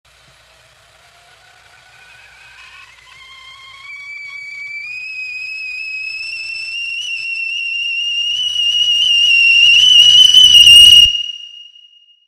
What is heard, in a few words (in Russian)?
zvuk svista chajnika